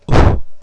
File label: bump; Alien Arena; megaman